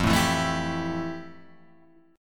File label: F Major 9th F, Guitar, Open G